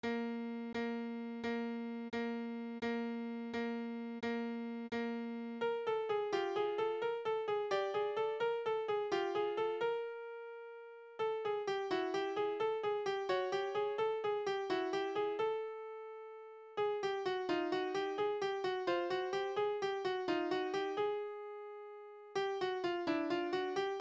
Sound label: Lip Slur Chromatic Warm Ups